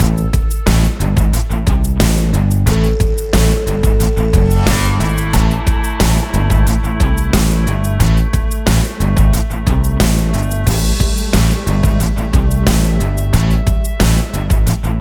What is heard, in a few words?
cheerful bass; by burak sound bank; cheerful vol 19